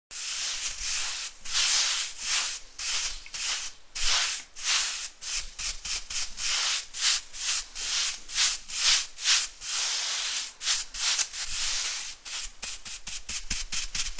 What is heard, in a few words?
Paint Brush, Brush strokes of painting a wall .; Hand Tools